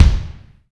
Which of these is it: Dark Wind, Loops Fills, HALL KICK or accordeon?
HALL KICK